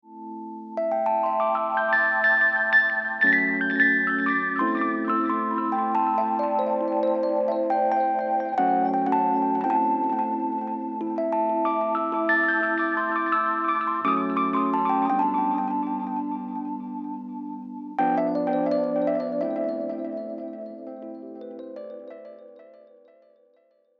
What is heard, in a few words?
Rhodes TAPE ECHO